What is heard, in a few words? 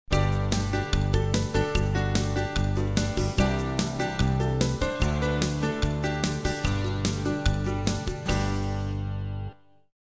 bgm